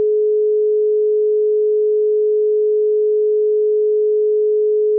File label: dialtone cept, Motorola; Q9h